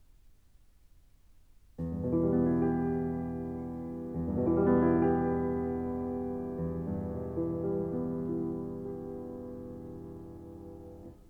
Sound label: song for voice & piano